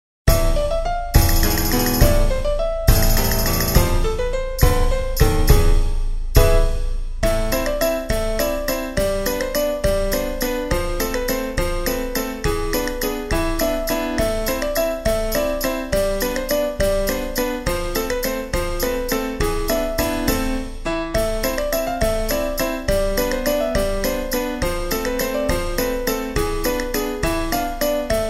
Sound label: Waltz; Espana